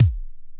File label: BD DX 7, bass drum; BASSDRUMS